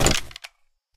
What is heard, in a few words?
jump landing, player; source engine; left 4 dead 2